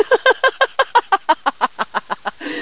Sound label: laugh f